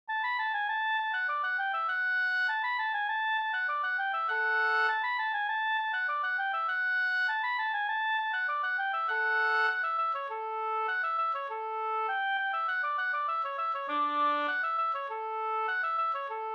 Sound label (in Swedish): halling; utl av Ole Hjorth